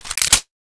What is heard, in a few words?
ppk mag in